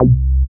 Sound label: SYN TB303; TB303+MC202; Sound & Vision - Gigapack I CD 2